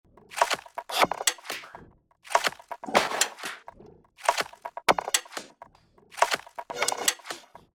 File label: trashcan loop Em 122; Basic Wavez Dulus